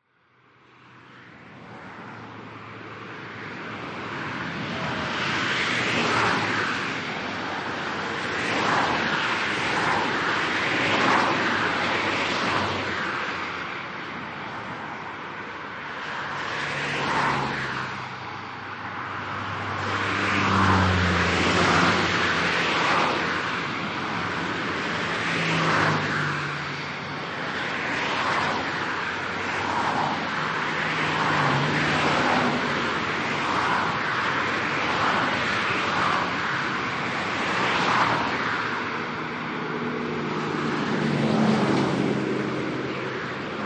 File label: Heavy Traffic On Freeway, Cars driving by at high speeds; No pedestrians, motorcycles or trucks - only cars driving by; Lynne Music